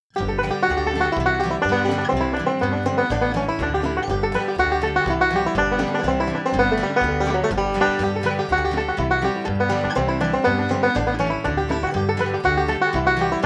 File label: Blackberry Blossom - Bluegrass Version, banjo